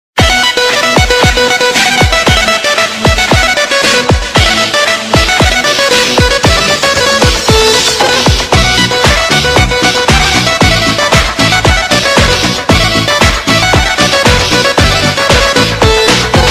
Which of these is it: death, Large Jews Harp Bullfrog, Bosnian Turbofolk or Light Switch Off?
Bosnian Turbofolk